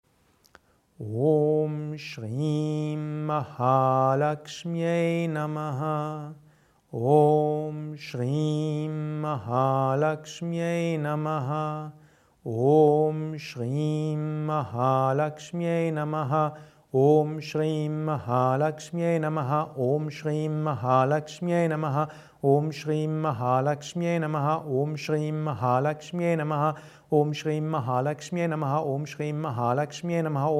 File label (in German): Om Shri Mahalakshmyai Namaha langsame schnelle langsame Japa Mantra Rezitation